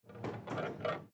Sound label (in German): Brettchen